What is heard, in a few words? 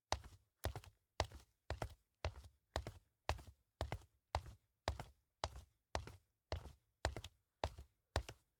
Footsteps Loafers; LOOPERS